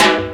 Bongo; Percussion; Vengeance Samples

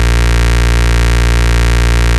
Root A#, Ultimate Bass; Vengeance Samples

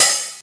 Open Hihats, Cymbals; Vengeance Samples

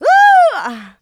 vocal house adlibs; PS-28 Vocal House Samples

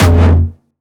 F2, Jumpstyle Kick; Jumpstyle Multis; vengeance essential club sounds-3; VEC3 Bassdrums

